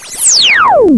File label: dissolve; tension